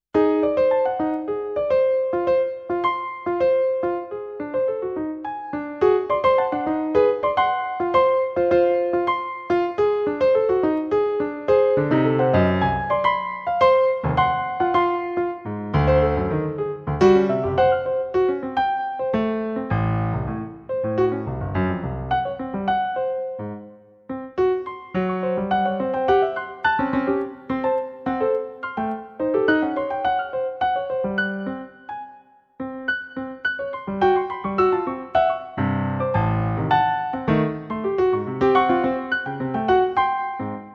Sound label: Toccata